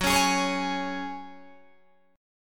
Gb Suspended 2nd, Guitar DADGAD

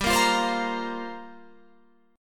G Minor 11th G down Guitar Open F; Gm11 strummed

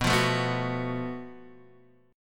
F sharp Minor Major 7th Double Flat 5th B

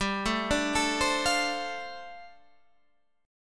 G 7th Suspended 2nd Suspended 4th; G7sus2sus4 arpeggiated

